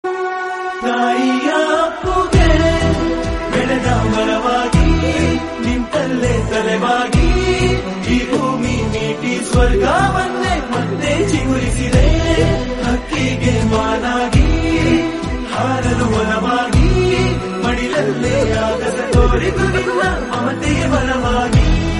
kannada songs

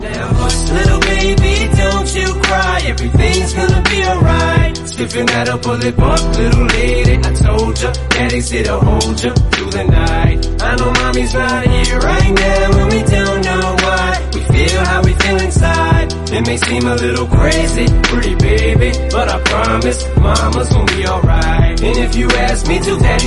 english song

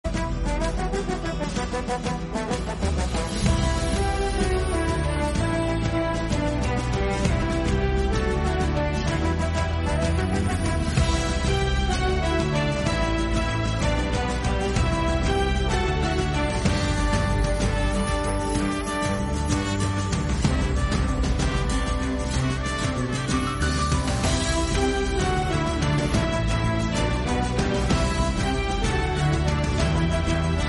intro bgm; icc cricket world cup 2019

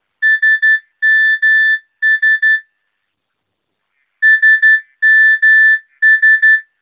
nokia special tone

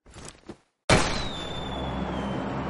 pubg gun sound notification

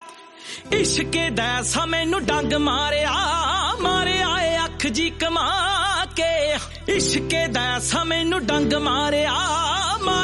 punjabi

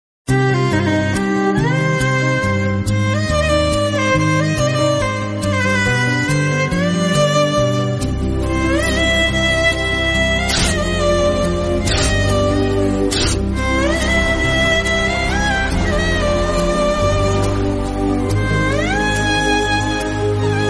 kannada bgm

